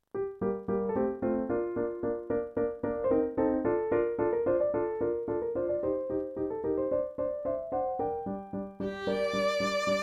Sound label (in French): Chanson Alouette, piano electronique; Chabrier